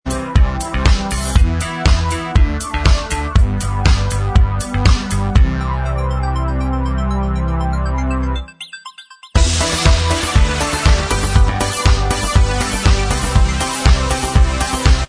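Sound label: instrumental track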